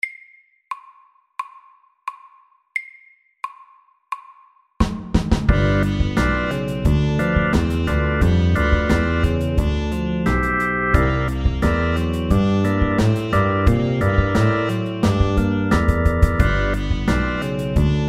8 bar blues SAKTE

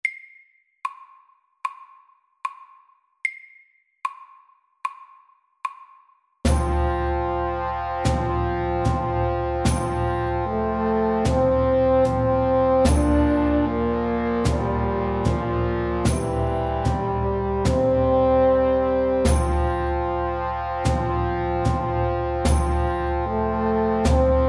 Engelsk melodi SAKTE

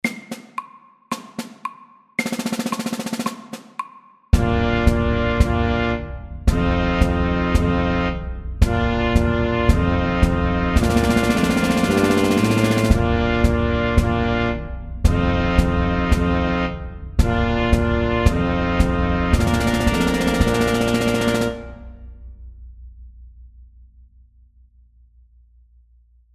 Minimarsj FAST 112

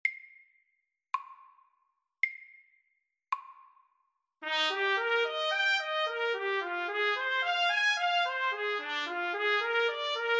Arpeggio etude SAKTE

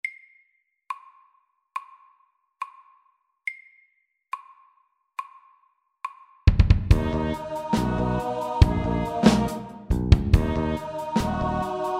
Octave rap MIDDELS

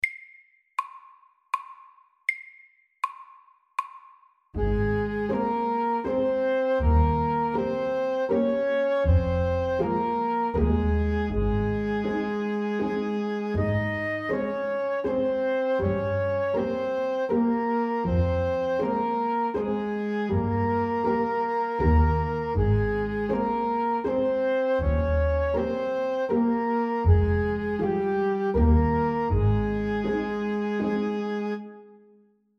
Legato vals SLOW

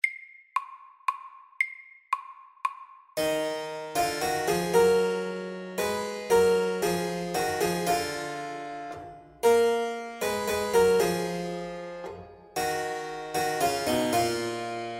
Metal minuet MIDDELS

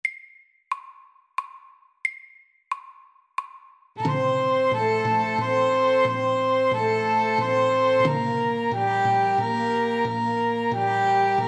Irsk melodi; SAKTE

